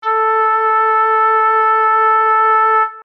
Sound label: tuning; rikotaro chikuwa